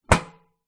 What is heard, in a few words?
household metal bread bin lid close